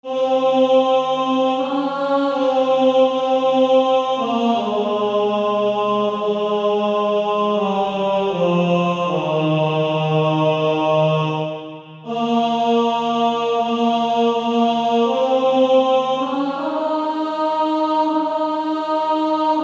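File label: Male Choir, tenor 1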